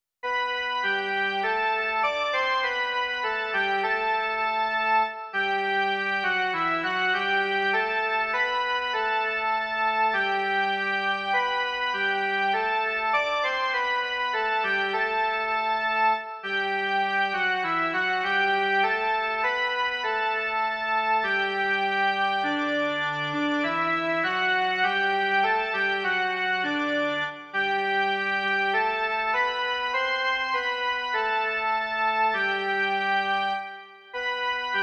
jen melodie; Již zpěv, prosby, kázání

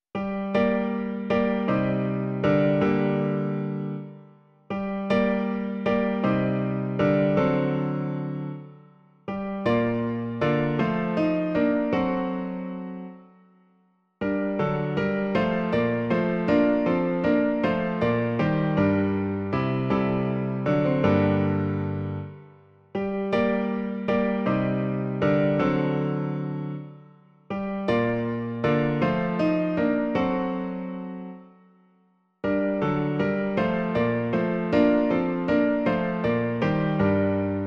Pán Bůh má síla jest - klavír; Klavírní verze

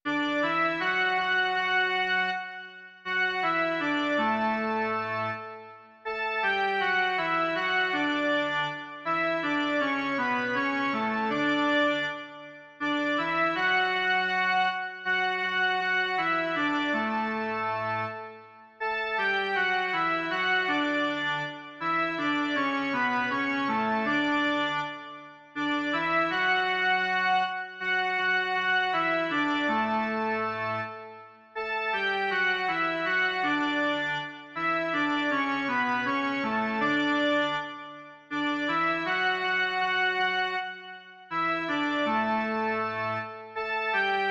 Blahoslavenství v průběhu roku - jen melodie; Doprovody Farského liturgie

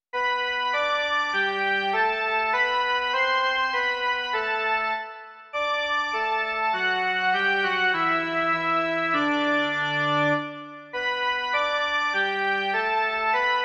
jen melodie; Kriste Králi, Dárci spásy

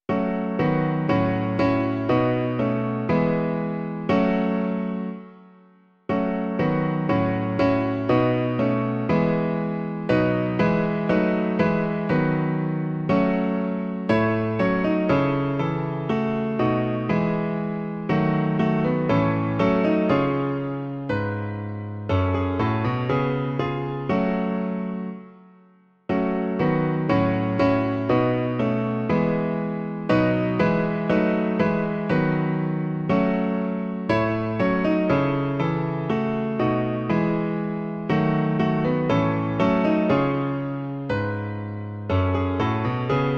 klavír; Klavírní verze

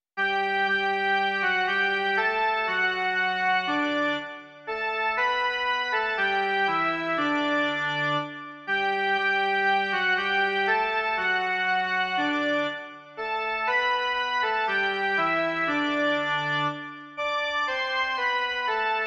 jen melodie; Chval Pána svého písní

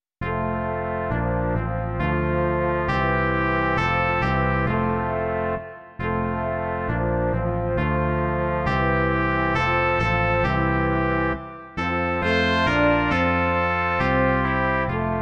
2 trubky, lesní roh a baskytara; Ó město Boží, ty jsi štít; Imaginativní verze

